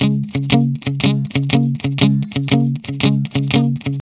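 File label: 120, Vintage Blues Guitar rhythm; USB Soundscan vol.22; AKAI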